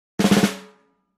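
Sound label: Drums Snare Short Crescendo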